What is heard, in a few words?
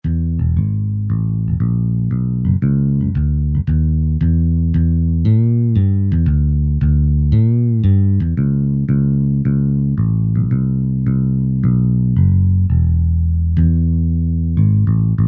Two Live Bass, Kurzweil K2000; Kurzweil K2000 Contemporary ROM